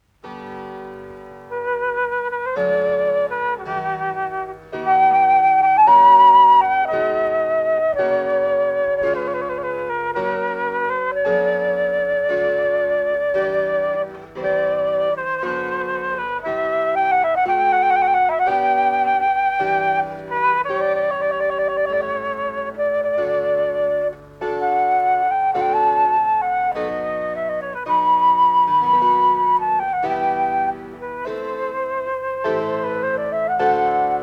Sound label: Creamsonata E minor - IV. Presto; Op.1,1